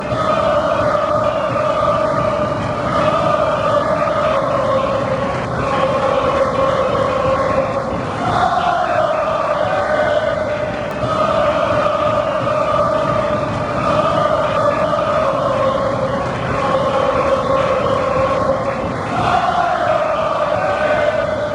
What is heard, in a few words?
lalala lalala blood and gold come on, chants